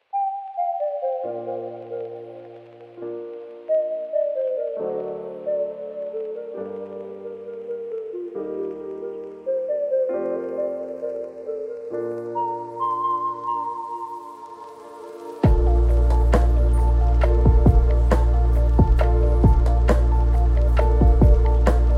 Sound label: instrumental lofi